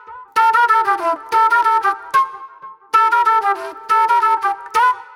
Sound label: Sharp Delay Flute